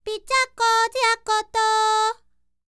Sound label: Vocal Planet World Voices, NOMADIC